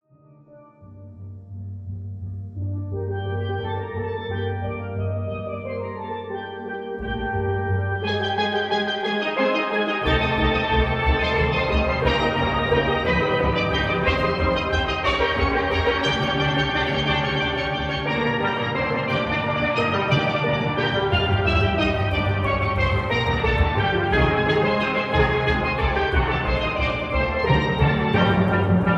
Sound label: Steel Band